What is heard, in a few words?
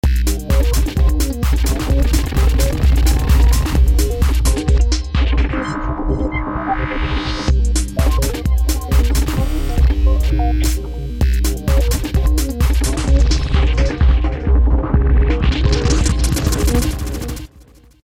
Machine drive, v-synth